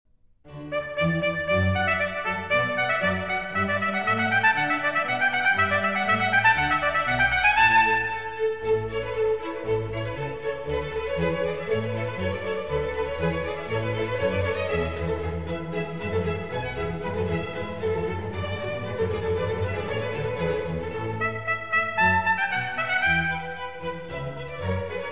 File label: Trumpet Concerto in D Major, Allegro; Telemann